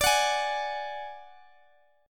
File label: D Major Flat 5th D Guitar Open D, chord